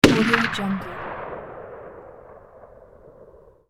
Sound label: gun fire single outside